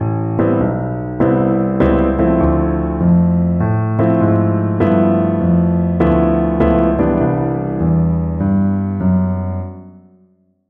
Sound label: Walking Bass Lines